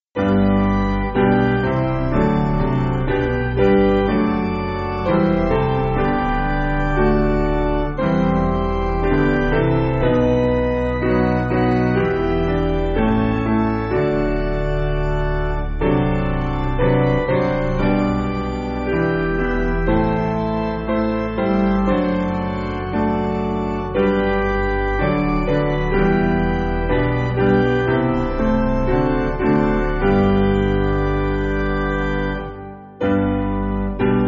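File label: Piano, Music Backing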